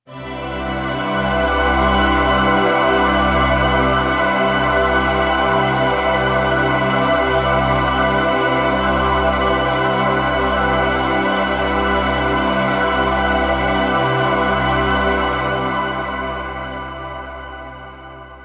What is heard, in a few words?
poly pad, Moogerphaser